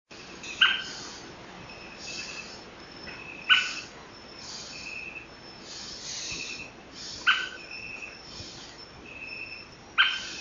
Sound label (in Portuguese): canto do anambe de asa branca